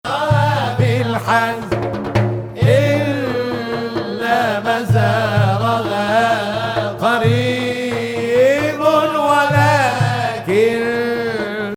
Hijaz Octave; Zanjaran

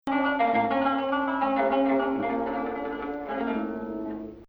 Hijaz

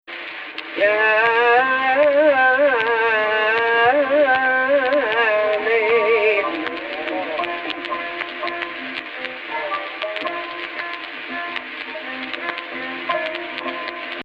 AHH, Egypt Rast

